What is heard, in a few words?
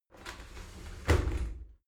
shower slide doors close soft